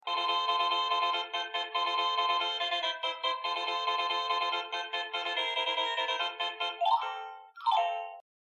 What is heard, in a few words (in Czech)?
melodie